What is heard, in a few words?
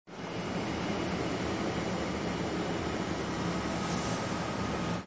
Front fans